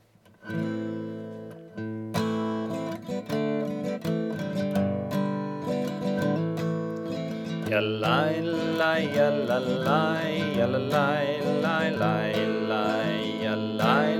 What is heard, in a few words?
High Voice; Hebrew language